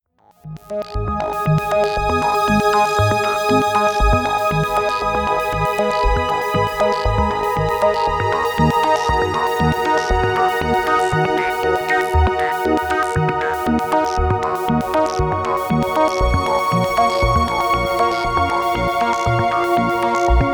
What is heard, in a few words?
synthex mix